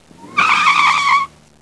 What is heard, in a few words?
tires